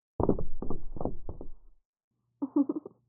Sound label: stumble